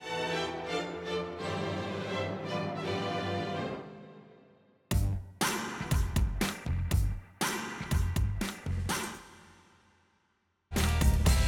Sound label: Music; Definitely Divorcing